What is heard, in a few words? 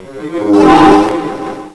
astral, space